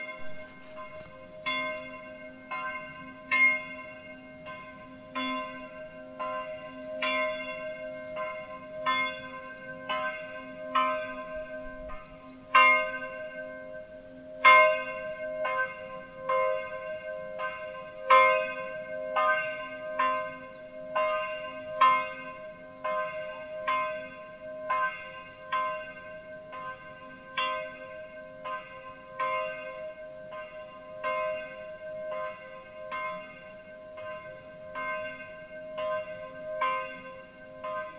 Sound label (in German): Glocke; Bruschied